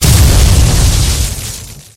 llamar rayos explosion

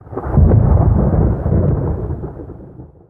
llamar rayos empezar

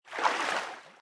nadar

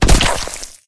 caida

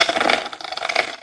acerrojar, puertas; ruidos

